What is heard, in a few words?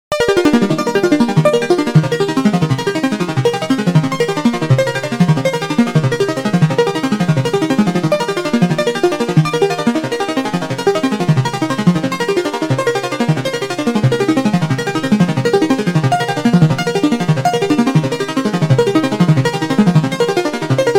Synced Reverse Pulse arp; Arpeggio; PRO12; opxpro2